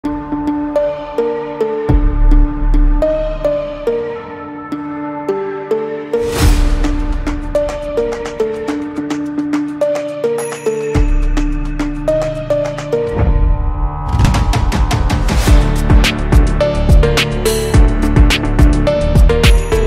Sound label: Phonk